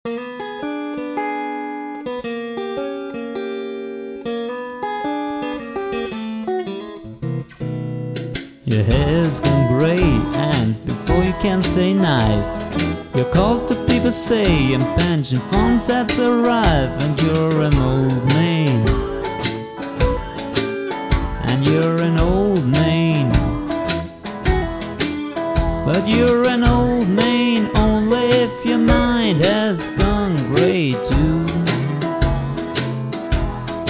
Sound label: blues